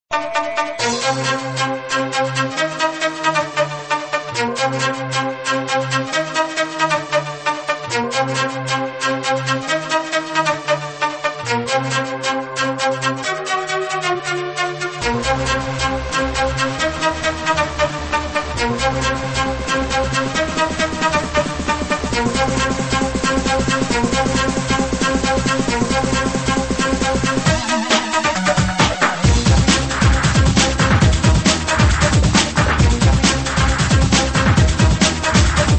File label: drum atack